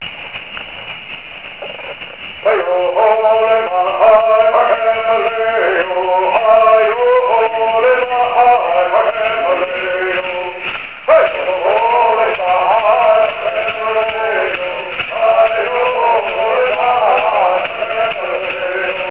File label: Bird